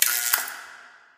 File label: light flicker; Source Engine; Portal 2